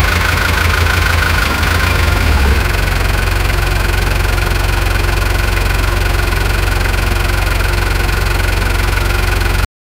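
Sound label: gasoline engine idle; 1930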